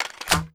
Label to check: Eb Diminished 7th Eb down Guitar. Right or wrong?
Wrong